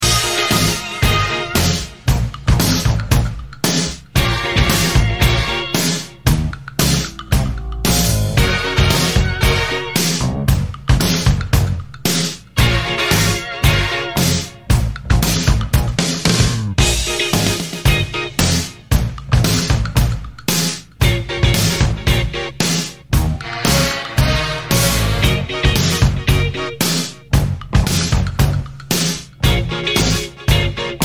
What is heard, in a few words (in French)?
Musique